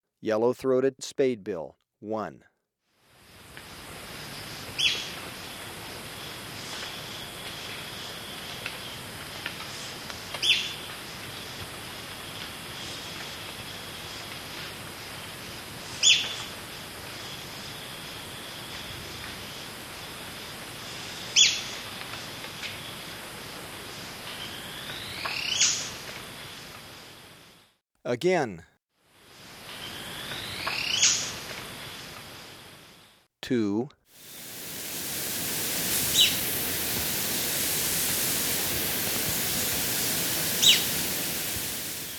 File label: Yellow-Throated Spadebill, Birds of Ecuador; S. America